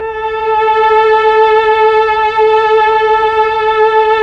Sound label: STR, Melo. Violins, Slow Violins